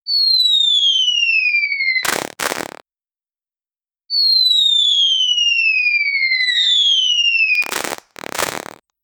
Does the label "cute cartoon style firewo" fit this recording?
Yes